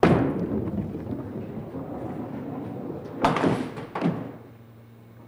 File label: Bowling Ball Roll Pin